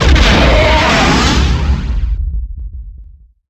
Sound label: boom